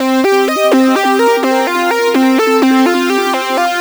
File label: Lead